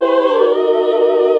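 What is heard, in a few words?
Classical Sing, choir; Best Service Gigapack 1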